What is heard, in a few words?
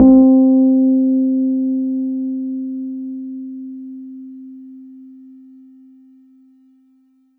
Yamaha V50 E Piano C4